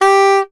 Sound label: Soprano Sax Portato G3; Orchestral; DirectWave; Varazdin Orchestral